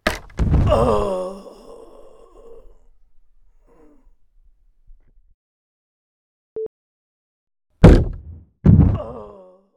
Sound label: Body Falling Through A Trapdoor Before After